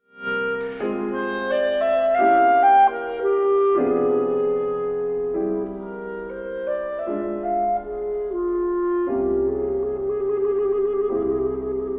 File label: solo de concours for clarinet and piano; henri rabaud